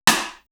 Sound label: Snare, Drum One Shots